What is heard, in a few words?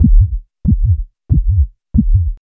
SYN FX; X-Static Goldmine 1